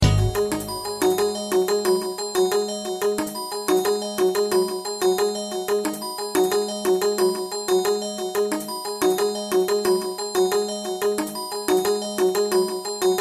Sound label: Lonely Ring; 3D Tones